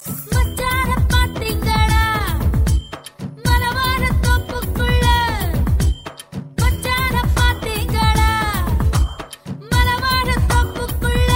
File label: tamil latest